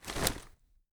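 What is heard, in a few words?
gear rattle weapon large; viper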